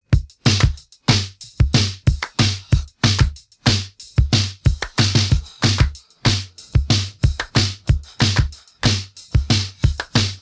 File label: drums pattern